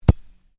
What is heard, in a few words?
Soccer Ball Bounce On Grass; Football; Sports